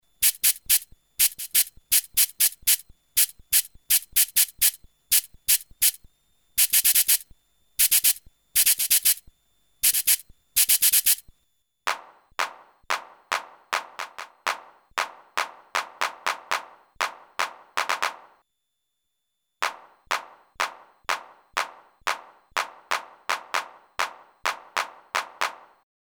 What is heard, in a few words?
Cabasa Handclap; drumport sa